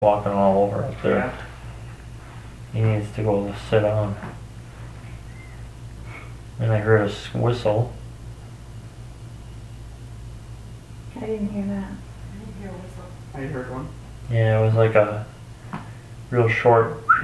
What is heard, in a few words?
Whistle; Rosenberry; EVP